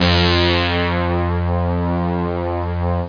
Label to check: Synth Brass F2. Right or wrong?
Right